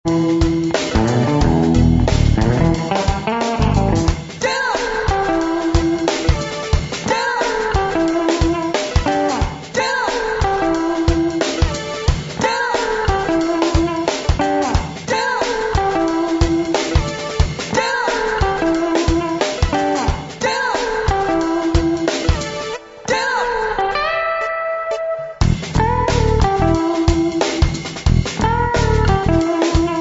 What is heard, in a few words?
Swingy; bg-music